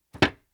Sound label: colt45 saa cartridge box; prosoundfx